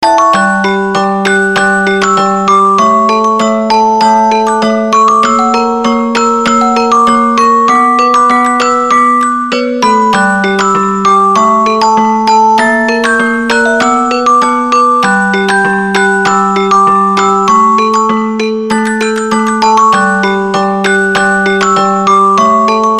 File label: oneway music box